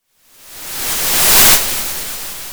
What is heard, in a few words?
Sound of waves crashing on a shore